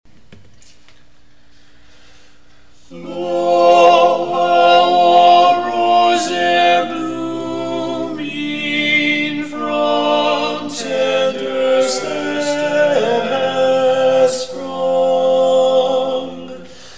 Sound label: tenor; lo how a rose eer blooming